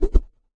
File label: kung fu staff, swings